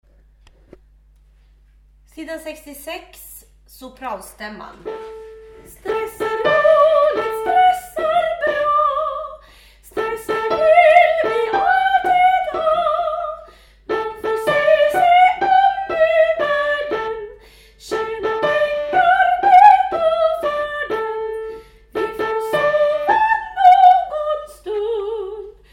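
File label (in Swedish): soprano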